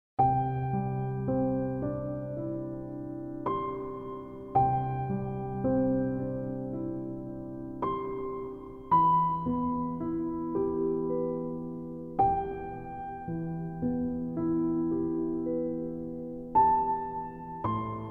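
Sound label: Emotions of the Violin Cello and Piano, Relaxing Music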